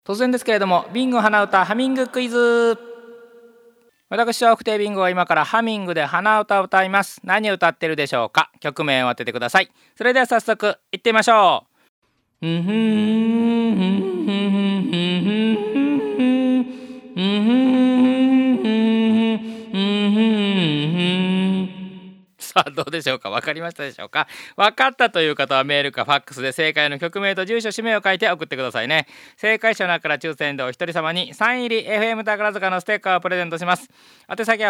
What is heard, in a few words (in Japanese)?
hanauta